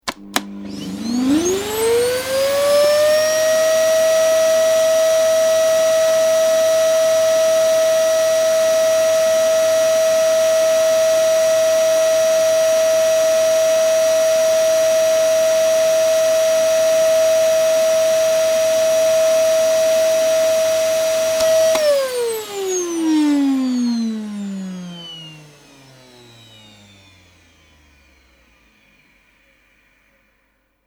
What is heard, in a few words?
Vacuum Cleaner, Sound of Vacuum cleaner being turned on and then off.; Cleaning; Household; Sound Effects:Household